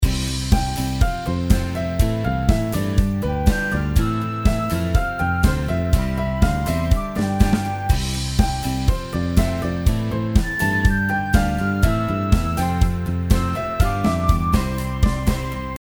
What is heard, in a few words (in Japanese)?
メロ＋ベース＋アコギ＋フルート